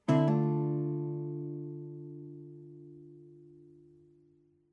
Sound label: chord